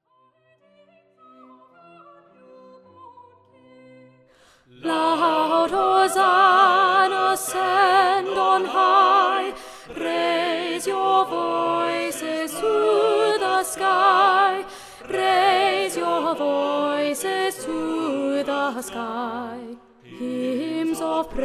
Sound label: Alto Up, While shepherds watched their fleecy care